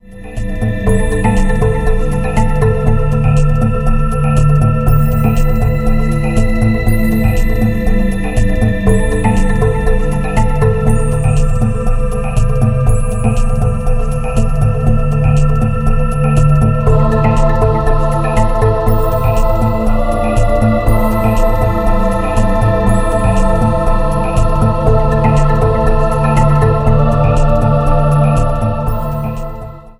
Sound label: Mysterious Electronic; Mysterious Electronic Science; MIUI12